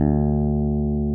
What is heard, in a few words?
JAZZ FINGER D3, BASS; Greytsounds; JAZZ+P BASS